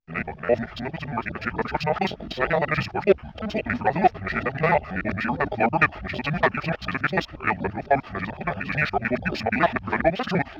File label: Vocal Garble